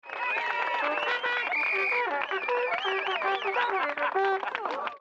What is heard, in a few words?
reveille